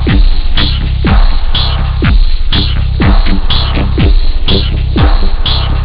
beat, instruments, wave, loop, house

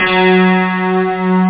synth

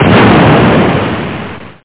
tank fire; ddaydemo

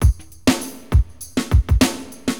Drums, AcidJazz Funk; hiphop; LiveAct Pool 1

